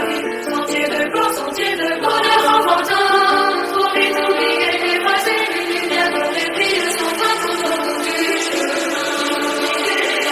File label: french techno tiktok song